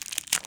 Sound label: Unwrap